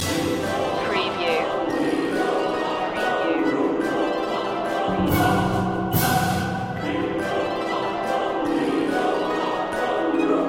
voices on the wind loop

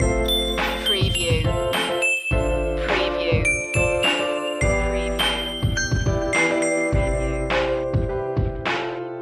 summer picnic loop

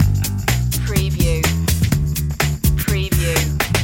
loop bass

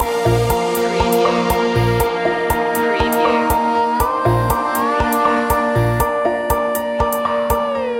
pop loop

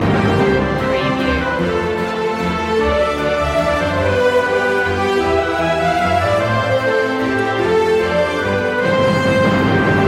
we wish you a merry christmas orch loop; media music now

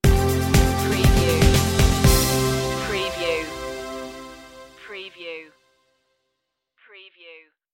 reflections stinger

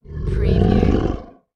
Monster Growl Deep

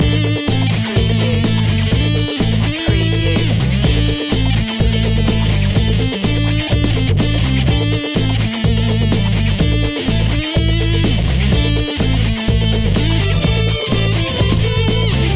disco funk loop

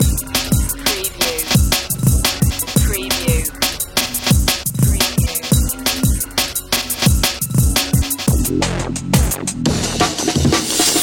stimulation loop

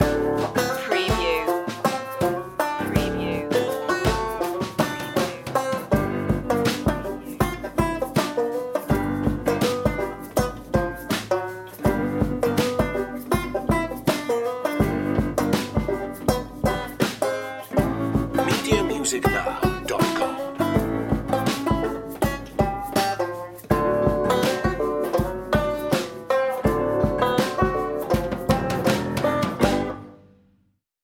funk swamp

